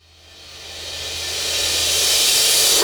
Uplifter

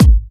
Bassdrums UK Trance Kicks; Vengeance Samples

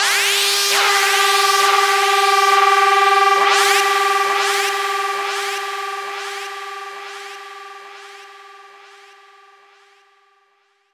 Downsweeps

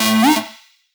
Synths C; Vengeance Samples

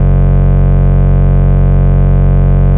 Ultimate Bass Root F#; Vengeance Samples

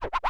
Cuts, Vinyl Record Samples

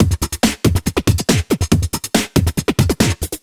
Breakbeat 140 BPM

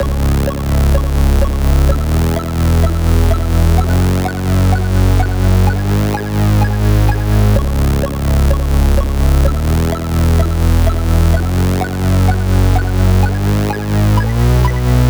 127BPM Melody; Vengeance Samples; Warhog Kit

